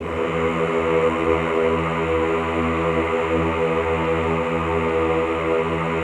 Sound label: EHH E1, Classical Choir; Best Service; Extended Classical Choir; EHH LO+HI LL